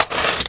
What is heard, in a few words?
rocket world reload, bazooka; weapons